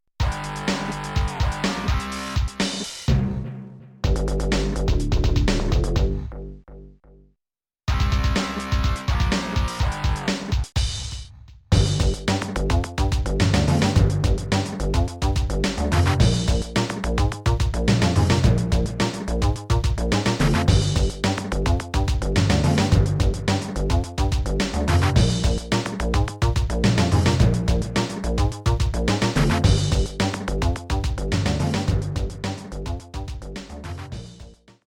Jingles; Reflexity; amiga

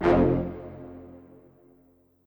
speech add

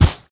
Carpet

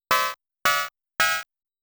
Coin Sound; mappy

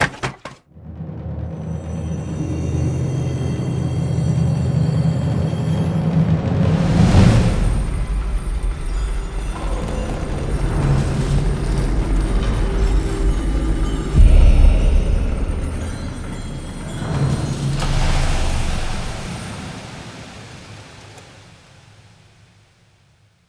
Pot Hit Floor; Death's Door; Grandma Boss Death Pot Hit Floor

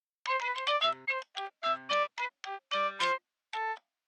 110bpm; phonebooth head; chicory a colorful tale; ps4

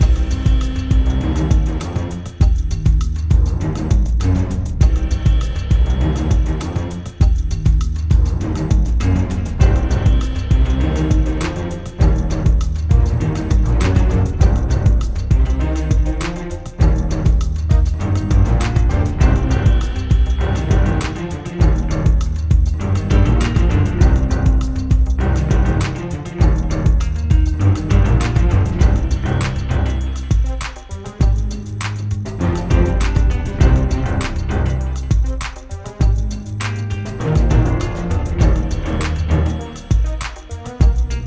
Groove; Ninja Turtles Legends; android

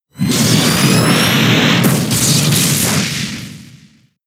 Electro Ball; pokemon sfx gen 6 attack moves xy oras

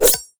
Monster Claw

